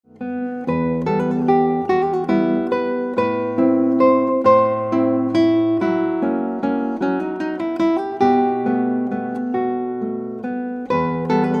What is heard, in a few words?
Guitar